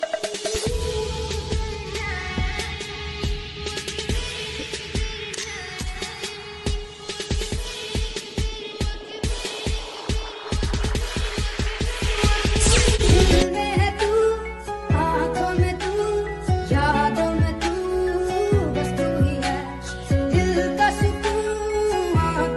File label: dj mix ringtone, bollywood ringtones